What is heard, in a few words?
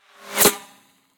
landing gun whiz; smite; Ares